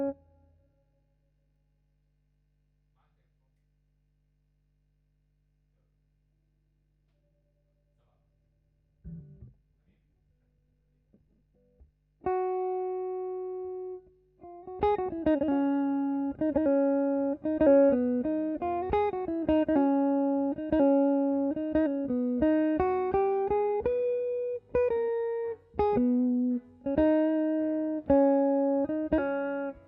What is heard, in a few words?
GTR B